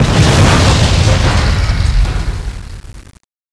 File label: car expl